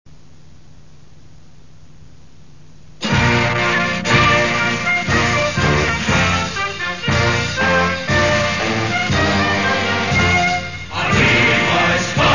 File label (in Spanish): himno nacional cantado